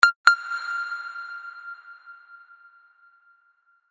Sms

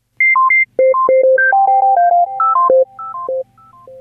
Cute echo delay Sms Tone

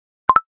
Snapchat Sound Notification

